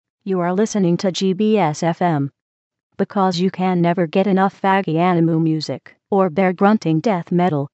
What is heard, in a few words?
synthesis